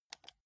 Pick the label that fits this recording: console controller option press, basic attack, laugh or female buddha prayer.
console controller option press